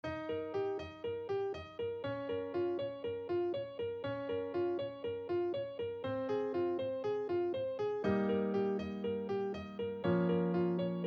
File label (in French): Piano Débutant 120bpm